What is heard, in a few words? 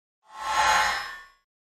Mouse Over Elect Wash